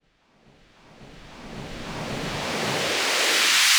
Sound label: Riser White Noise